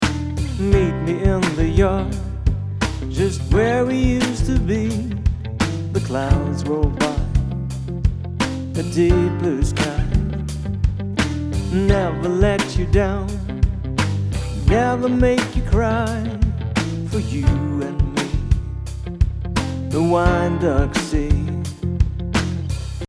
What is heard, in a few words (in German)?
preamp hoervergleich